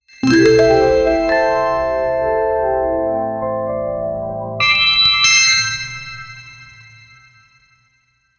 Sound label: outro jingle